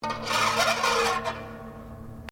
Hammer Dulcimer Effect Scrape, Old Chinese Hammer Dulcimer; Musical; Strings